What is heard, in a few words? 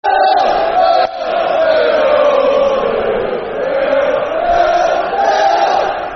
Vale, Vale [Football Chant (Soccer Songs)], Port Vale; Port Vale Pvfc